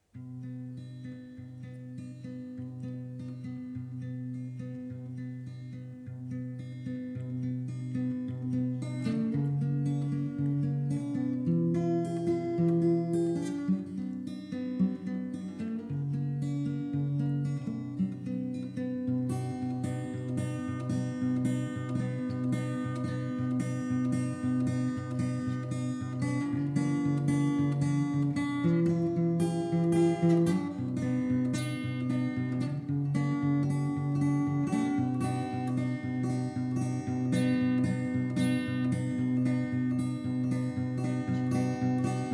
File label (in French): bella ciao guitare